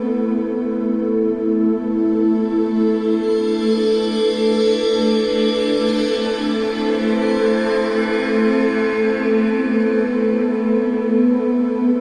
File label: Magical, фоновый звук